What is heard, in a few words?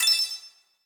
item crystal